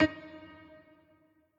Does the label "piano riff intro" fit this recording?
Yes